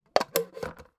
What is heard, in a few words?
plastic cup lid and straw push down on cup; food drink; macdonalds